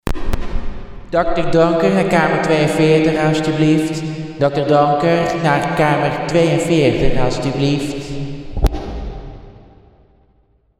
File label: Intercom